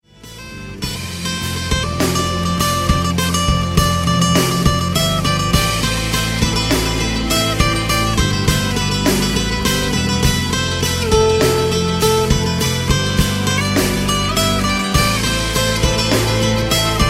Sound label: guitars; Indian